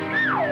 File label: slide down with music